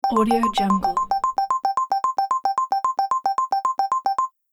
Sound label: multimedia data readout